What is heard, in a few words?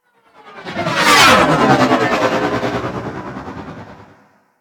Ship Close Fly By, Engines